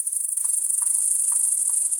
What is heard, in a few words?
Night Crickets; Dark Textures